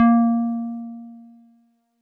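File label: Wood Piano DX100 A#1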